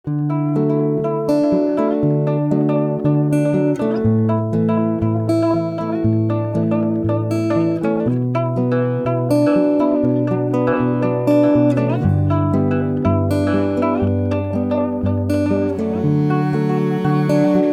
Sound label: blues